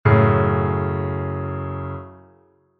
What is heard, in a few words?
F♯m, Chord Inversions